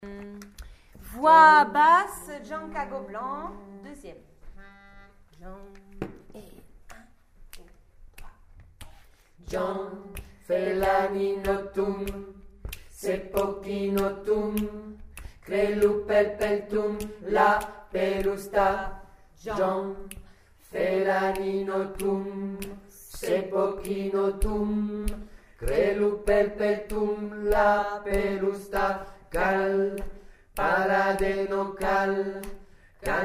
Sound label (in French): voix basse